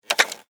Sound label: Telephone Rotary Base Pick Up Antique; Technology; Telephones